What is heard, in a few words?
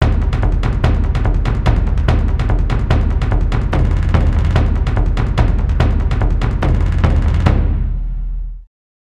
Fatality Drumroll; mortal kombat gold